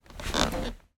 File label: household chair sofa person get up springs